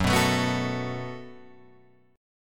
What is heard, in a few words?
F sharp Minor Double Flat 5th F sharp, Guitar Open G